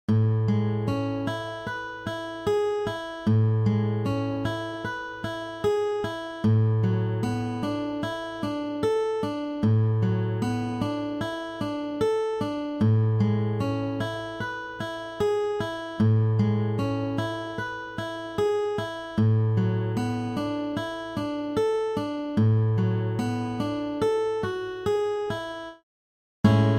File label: silent night guitar arrangement; christmas music and carols